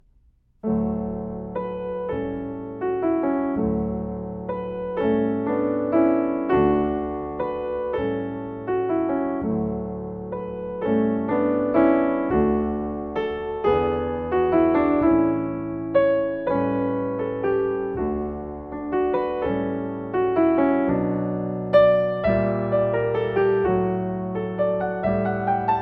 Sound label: raining and swing me live piano accompaniment; eyfs and key stage 1